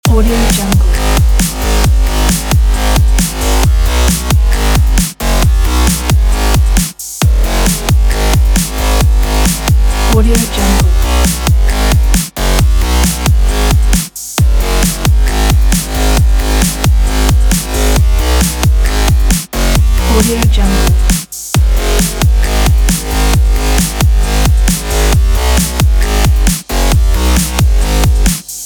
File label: breaks loop